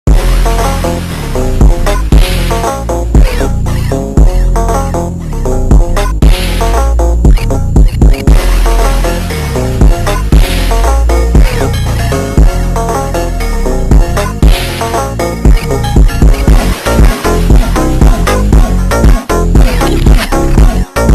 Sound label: baixo slowed